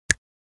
Finger snap

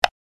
Video game controller buttons

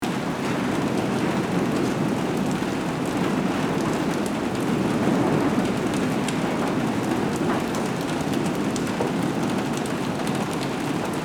Rain on farm barn roof pattering

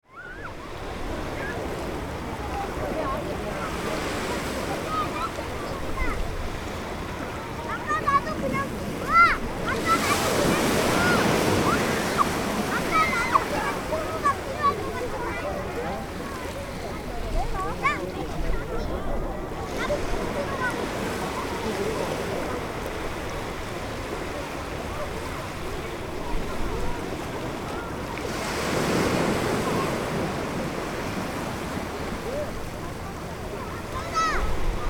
Beach ambience ocean waves summer family

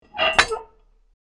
Tank hatch closing

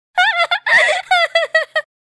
Teenage girl giggle

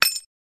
Glass breaking small; Glass Sound Effects

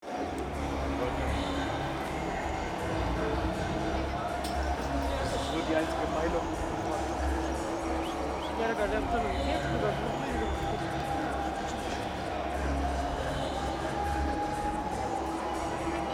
Park concert ambience distant music and crowds

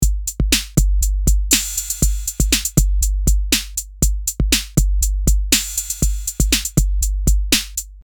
E-Drum loop

